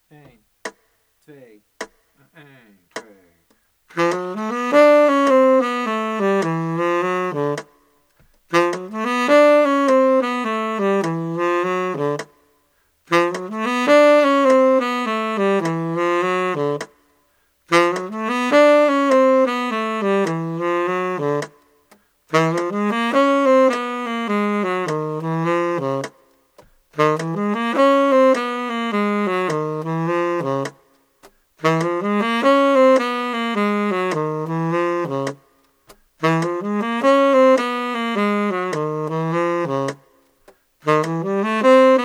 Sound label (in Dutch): jazz lick in klinkend D Db C B